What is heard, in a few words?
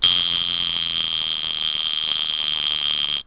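Alarm watch; toy; METROPOLIS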